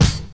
rap kick drum sample c key, Royality free kick drum tuned to the C note.